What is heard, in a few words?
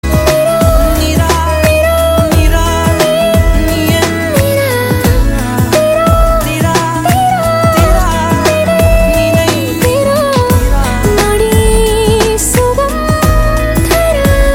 Female Ringtone; Takkar; Tamil